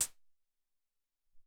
Tek Hat, Alesis SR-18

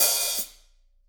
HH, Drums; Bob Clearmountain Drums I

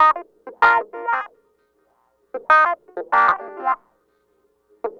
Clavinet, 70s Analog Funk

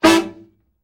Killer Horns, SECTION 7TH; Best Service

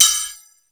MONDO PERC, METALIC DRM; Crypton - DrumBase5000; METALIC DRM2

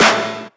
Heavy Snare; Zero-G Sound Sense Grime Scene; GS Kits

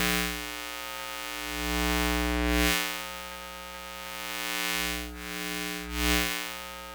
Electromagnetic Fields

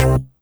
Synth Hit C

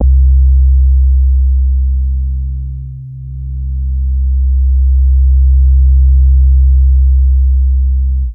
Big Synth Basses

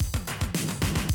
Classic DrumLoops, 110

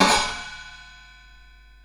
Raw HiHat, contact mic

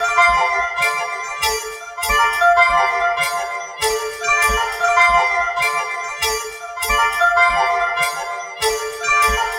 Indian Banjos, Strings; Sonic Foundry (Sony Creative Software); Myths of Technology

